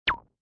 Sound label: Multimedia, Blip, Blurp, Button, Menu, Rollover, Web Page Or Flash Animation Sound; Beep